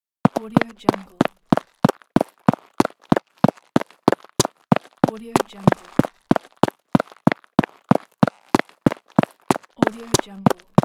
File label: running with sneakers on a road